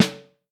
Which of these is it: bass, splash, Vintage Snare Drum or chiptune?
Vintage Snare Drum